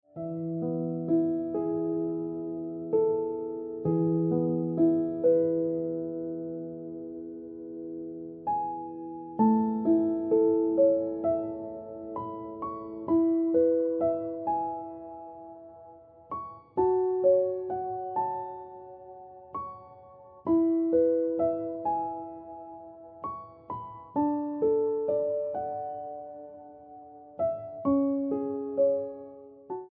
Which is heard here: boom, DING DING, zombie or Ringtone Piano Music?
Ringtone Piano Music